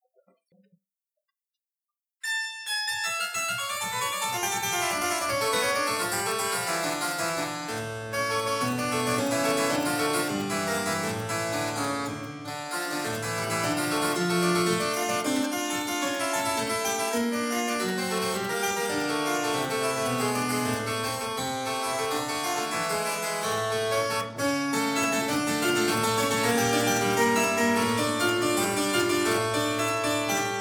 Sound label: Revival harpsichord; historical instruments; Podbielski; Praeludium